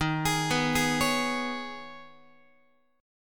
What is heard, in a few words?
D sharp 7th Suspended 4th Sharp 5th, Guitar; arpeggiated; x,11,11,9,12,12; 4A,4E,5A,5D,5G#,6C#; D#7sus4#5; JGuitar